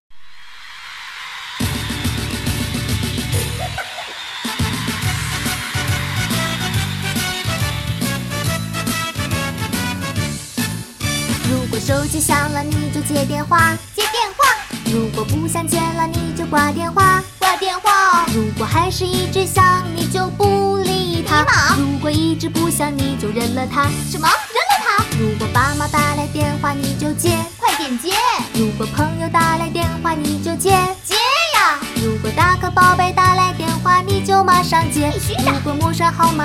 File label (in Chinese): 歌曲类 幸福拍手歌; 女; 国语女声配音; 儿歌